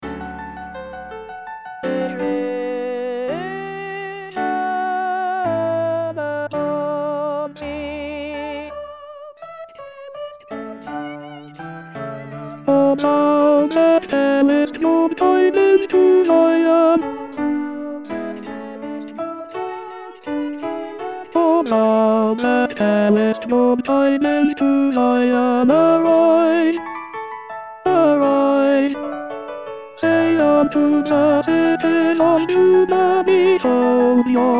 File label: Tenor; Handel; Messiah; O Thou That Tellest